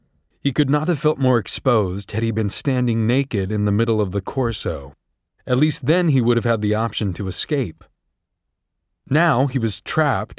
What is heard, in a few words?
ballad songbirds